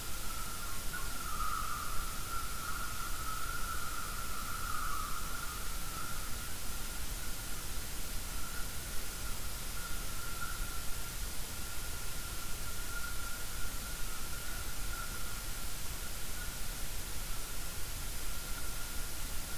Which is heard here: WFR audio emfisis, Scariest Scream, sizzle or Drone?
WFR audio emfisis